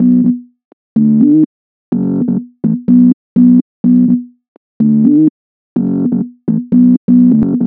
Deep Grooving, Bass; Sonic Foundry (Sony Creative Software); Underground UK House & Electro

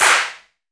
CLAP, HAND CLAP; AKAI S6000 CD-ROM - Volume 5

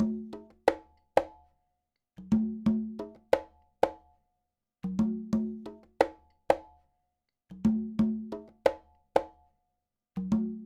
Conga, Latin Percussion

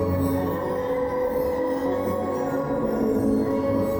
Tonal Re Draw, sparse soundscape

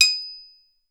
ice bell, metal bells; Voice Crystal - Steve Reid Definitive Percussion

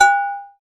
FX metal; Sound & Vision - Gigapack II

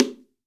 BONGO, STEREO BONGO

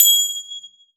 BELL, METAL; AKAI S-Series CD-ROM Sound Library VOL-1

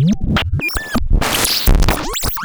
Cwejman S1 - Mechanical Arm

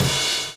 DOWN, DOWN KIT 78; AMG Gota Yashiki Groove Activator

